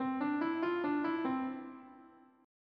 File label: Bach C Major Invention